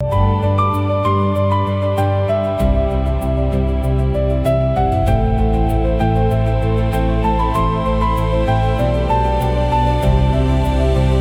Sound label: loop; bgm; education